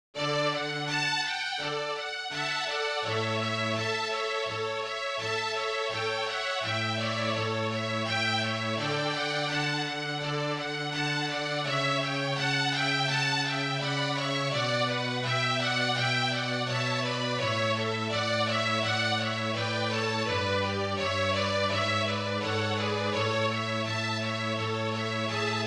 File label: Largo Flute Trio; MIDI Demo; Vivaldi Winter